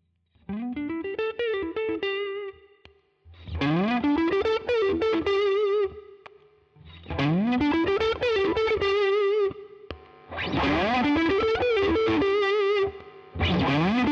Strat 12 Uhr Gain 12 Uhr Tone STOCK different Dipswitches